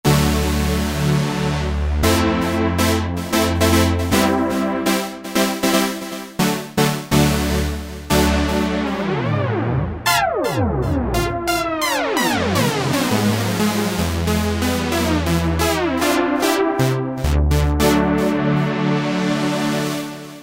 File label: Sweep Poly Pad, Korg microKORG; Factory Voices